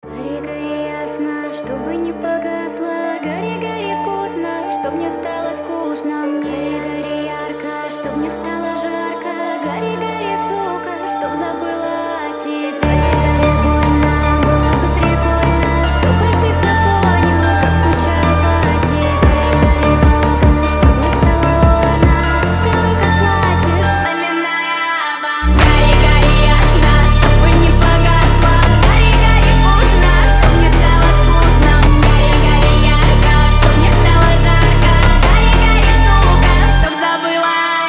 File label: Sped Up